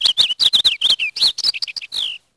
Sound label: Bird; 特酷声音素材库